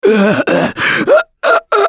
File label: Choke, russian, Survivor Voice; Gambler